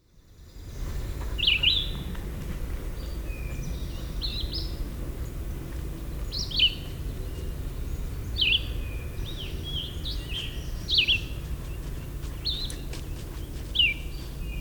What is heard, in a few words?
Red-eyed vireo; Rattlesnake Hill